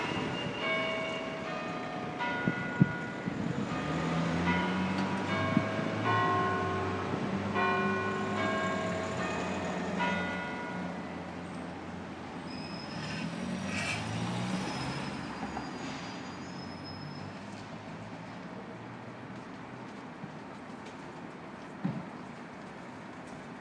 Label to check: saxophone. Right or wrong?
Wrong